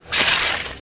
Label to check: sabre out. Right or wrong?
Right